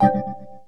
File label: Beep